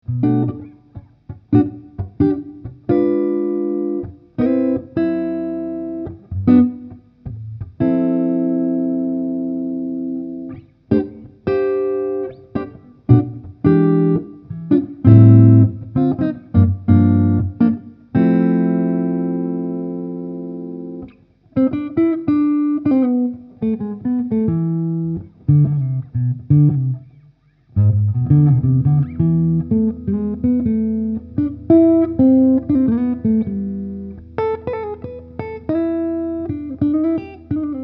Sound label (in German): Halspickup jazzy Schiebeschalter oben